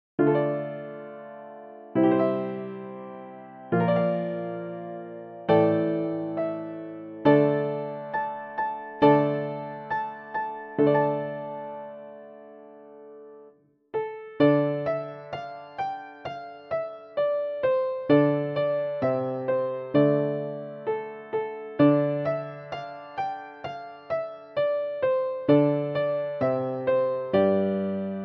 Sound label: the huron carol piano; christmas music and carols